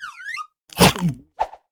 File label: feed, comic; obelix